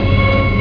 cock; SSTEEL

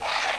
slide; CHECKERMANIA 1.04

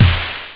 house, drums snare; OctaMED SoundStudio V1

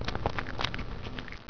Eating; Snavely! 1.0.4

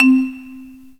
C3 MARMBA

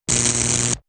Electric; JONAH

